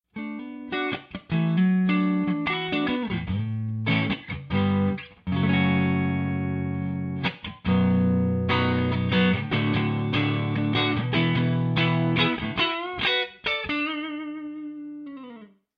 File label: clean